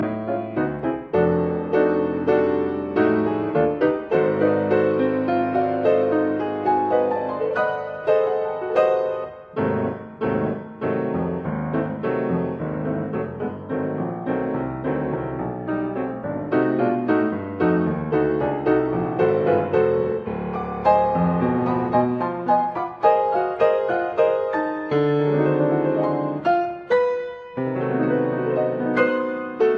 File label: Piano Sonata III